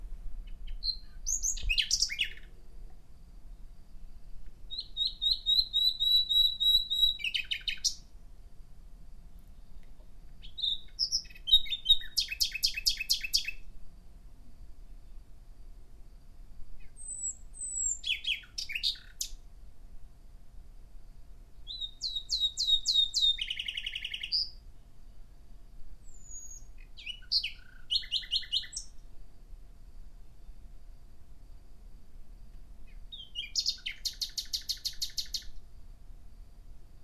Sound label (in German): Nachtigall